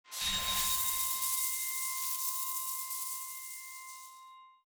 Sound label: black cannon light